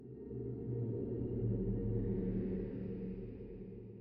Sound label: spooky ambient sound